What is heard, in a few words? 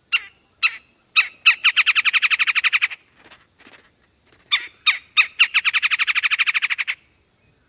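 chatter-chi, Red-bellied Woodpecker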